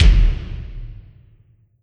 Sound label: Yamaha RM50 Stadium Kick